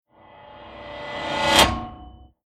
Reverse Lever Switch Impact Stinger Industrial Whoosh; Sound Design; Stinger Sound Effects